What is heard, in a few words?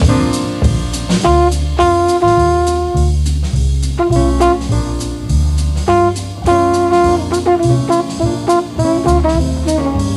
Flugelin The Blues chorus rhythm